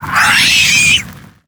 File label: Fiery Bat Play